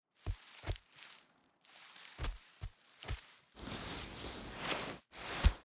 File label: Foley Shuffle Hand; Garren Heal Part Three; Garren Boss Arena